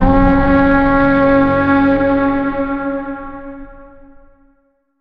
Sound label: Horn